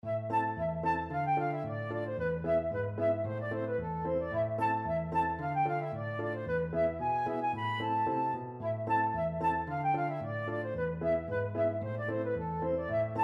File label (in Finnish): polkka, flute